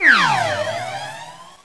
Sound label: Degradation Alert